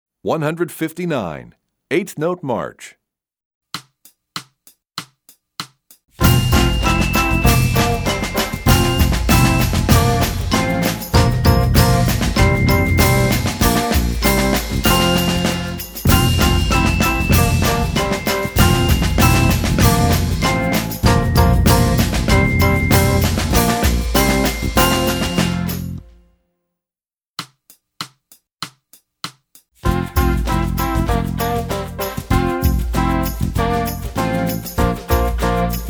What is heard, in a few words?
Eighth Note, Percussion; EE2000 Book1